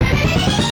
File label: hit jingles; kenney musicjingles